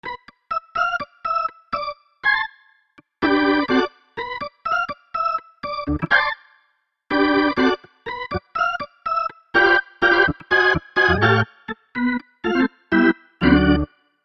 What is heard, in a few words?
Soft, electric organ; electrickeys